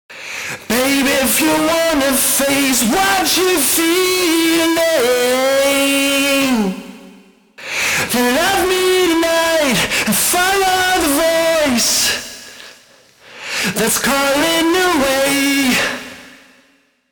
vocals distortion; Saturator X

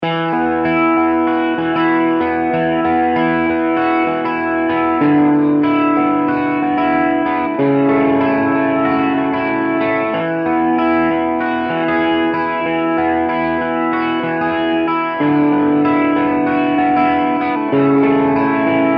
Super Sonic and Vibratone; fender

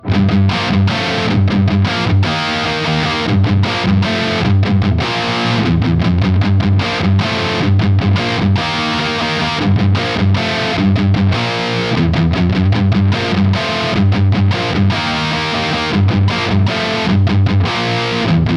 Mesa Triple Vintage; TONE X; machine modeling

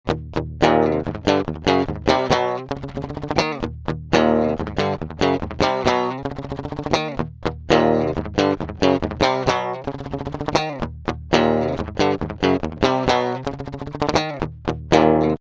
MH 500 Speed Rhythm unproc; fender

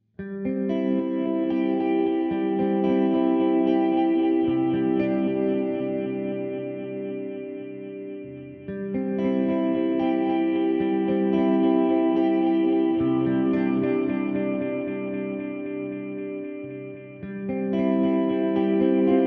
TIME SWELL; xgear

